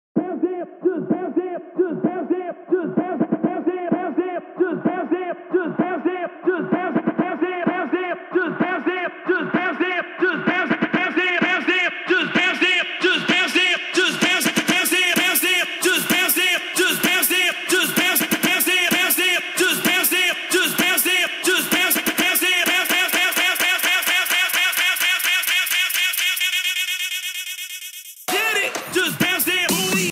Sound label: Studio Acapella